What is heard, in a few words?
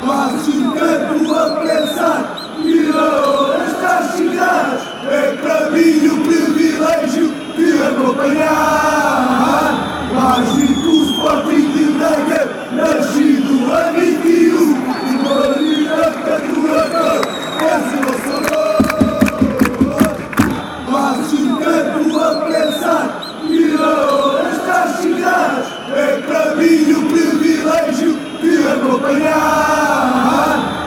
fan chants; magic braga